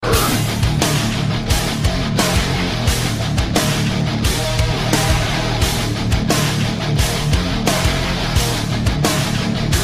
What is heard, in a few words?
Breakdown Metal